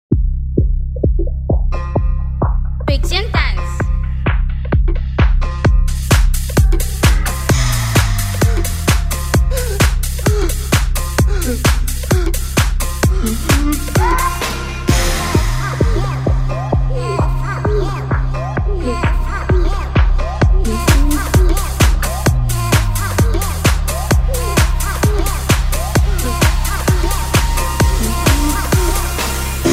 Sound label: club takeover dance club house